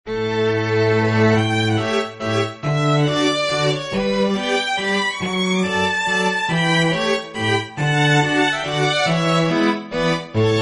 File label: Mazurka; Coppelia